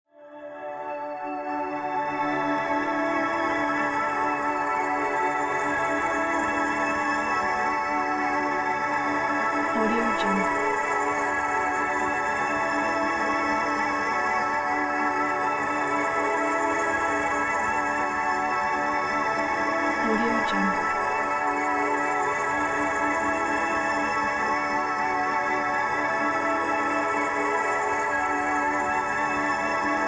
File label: romantic cinematic drone soundscape